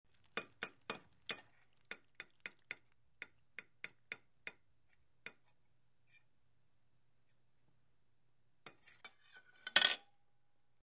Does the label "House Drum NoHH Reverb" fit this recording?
No